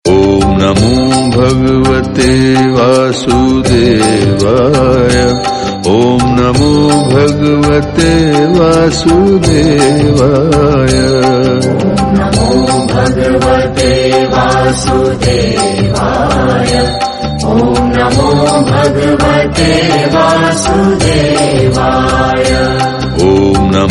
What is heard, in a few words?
om namo bhagwate vasudevay